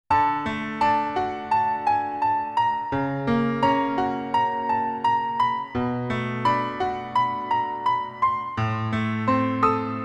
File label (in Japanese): ピアノ; Hokenshitsu e Youkoso